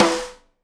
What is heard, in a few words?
SNARE RIM, Live Snares; E-MU Producer Series Vol. 8 – Platinum Phatt (CD 1)